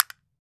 CASTANETS; AKAI S6000 CD-ROM - Volume 5

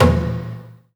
A#3 TONE, JAPANI PERC; Northstar; The Wizard VOL-1

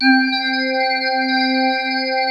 FARFISA; Korg M-1; Rubber Chicken Software Sample Bank

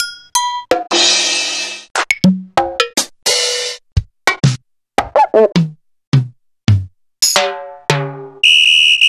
Yamaha RX-21

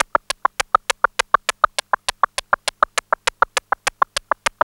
FX LOOPS

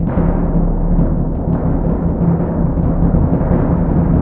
BS DRM

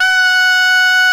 gralla F#5; SoundBytes - Early Patches

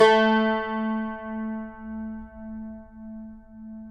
CUMBUS A, Guitars; Spectrasonics; Hans Zimmer Guitars VOL-2

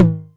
Tom Hi, Novation Drumstation 909

